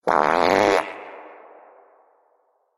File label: fart; hl2dm